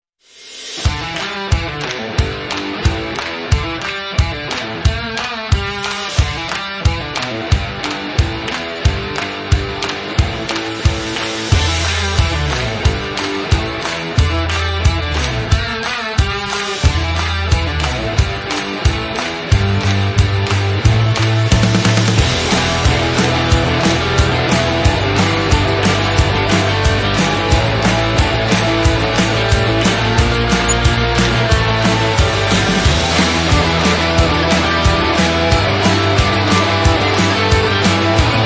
energetic rock hiking free music; rock and roll

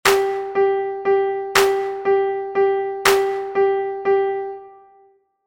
triple sonido accented beat

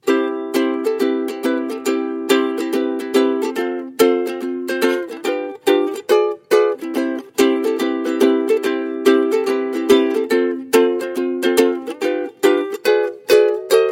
ukelele

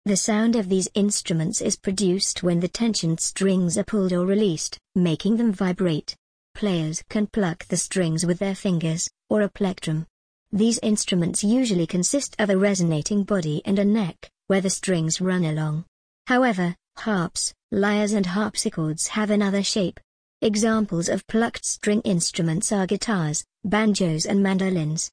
plucked string instruments

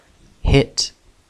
hit

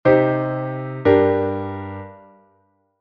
plagal

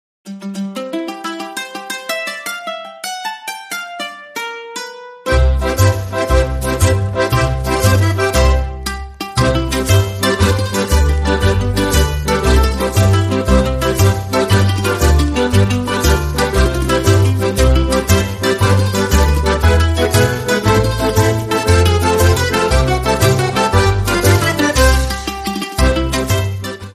italian italy tarantella music

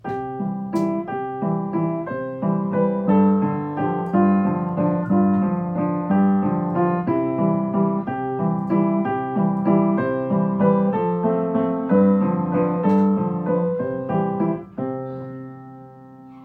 vals

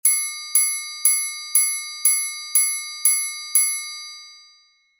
triangle sound

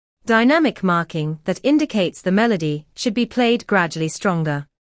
rinforzando

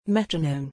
metronome

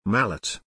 mallet

